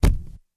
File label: Camera flash, flash pot, deeper, less explosive; cameras